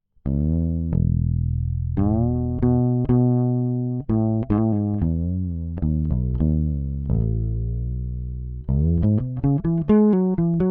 Fretless no preamp Neck Pickup

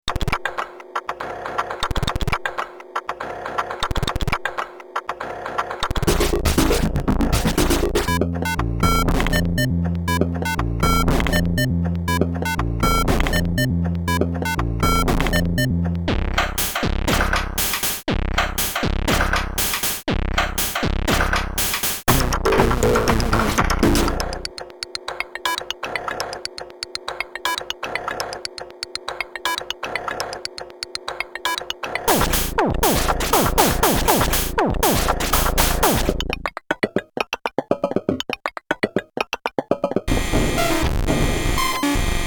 Pocket Operator 24 Pattern

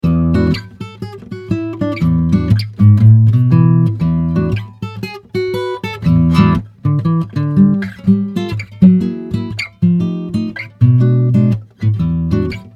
Blueridge BR 43 Finger

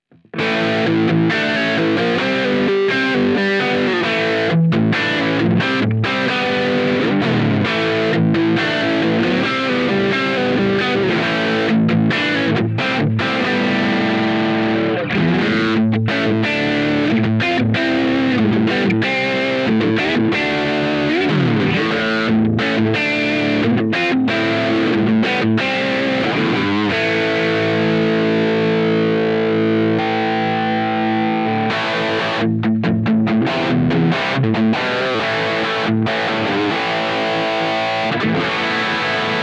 Schecter Drive Neck to Bridge Humbucker; Rob Scallon

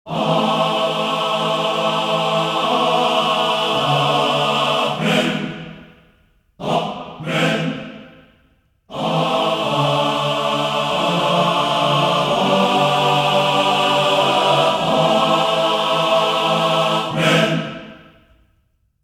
Marcato Phrase

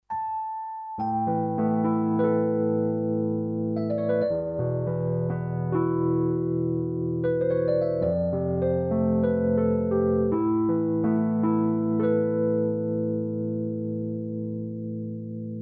ESI Neva Duo Line In

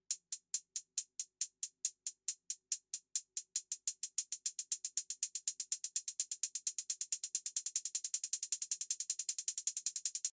Closed HH

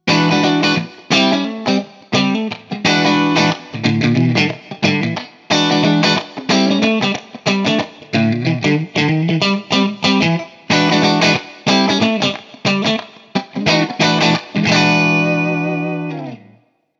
Hi Input Bass Shape; Artisan CH1

